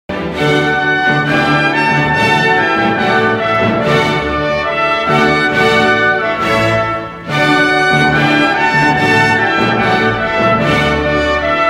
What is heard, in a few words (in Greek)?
Presto Συμφωνία Νο9 σε ρε μινόρε; L.V.Beethoven